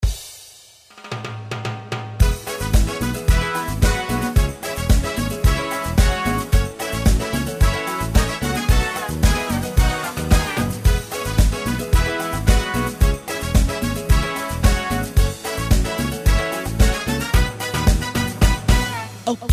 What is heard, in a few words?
merengue